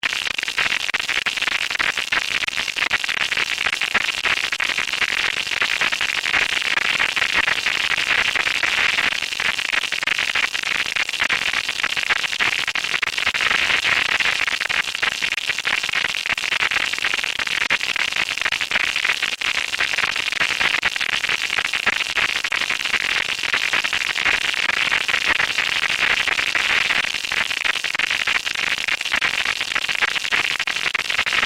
Transmission Static, Scifi, Transmission, Static, Radio, Science, Fiction; Communication; BlastWave